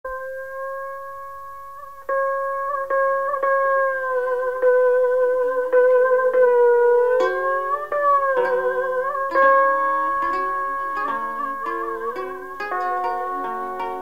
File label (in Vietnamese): lai ve qua nui thien thai dan ca khu 5